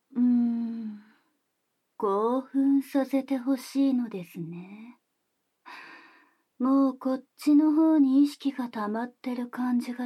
耳舐め; デカパイお姉さんが耳舐めたりしてくれる（布越しの愛撫、耳舐め）

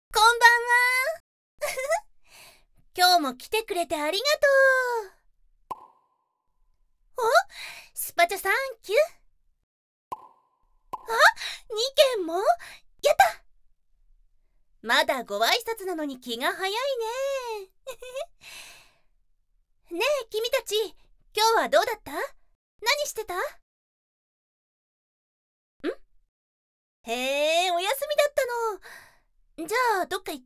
配信女子の涎垂らし SE入り

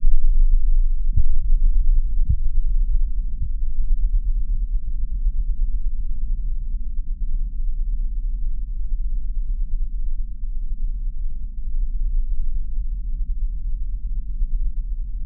性的行為に必要な周波数「～30Hz」

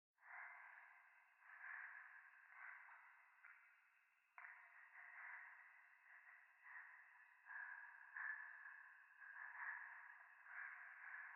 廃墟 右耳快感吐息 山奥夜

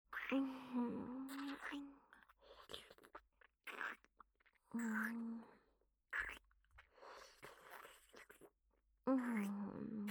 キス、乳首舐め、左右耳舐め; アドリブ集

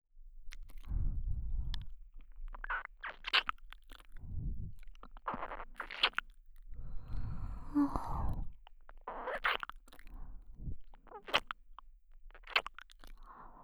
右耳キス

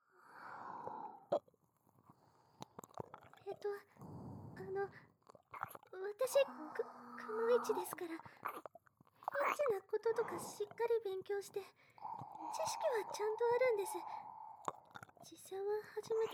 耳舐めされながら修行中の巨乳オドオドくノ一にキス、手コキ、乳首舐め、オナニーされる音声, ASMR

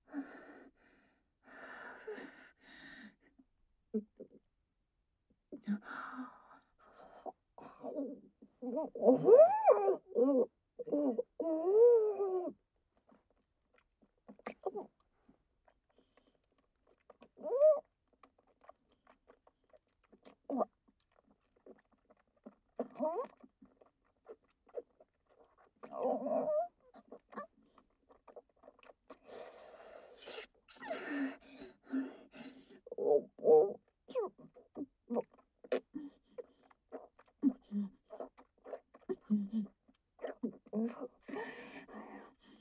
ご奉仕パイズリフェラループトラック, ASMR

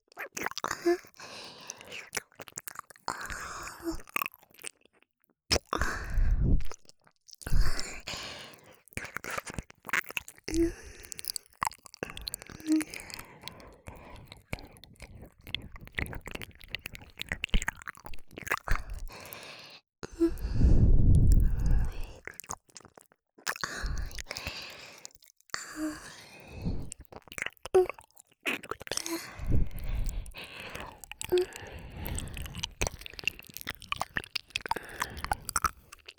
右耳舐め, ASMR

